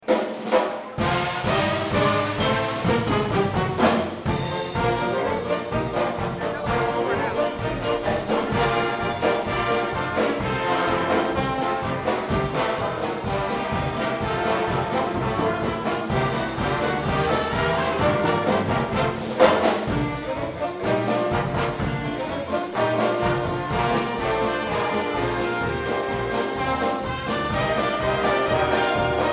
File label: Polka Medley; PenMar 2011